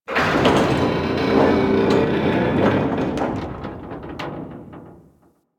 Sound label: Research Facil Crane Side Door Open Start; Venus; Objective Rooms; Props One Offs